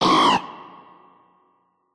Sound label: cry